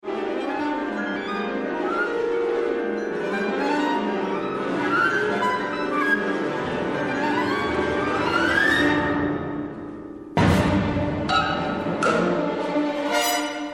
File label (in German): feuerwerk orch